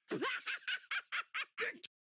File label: Laugh; Luffy; Goal Sound; Blue Lock Rivals; roblox